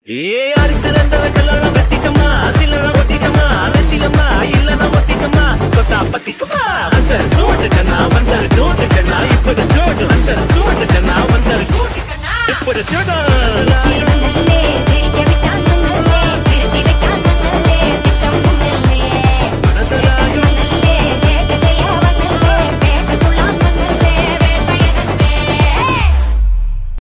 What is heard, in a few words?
tamil bgm ringtone; Vettaiyan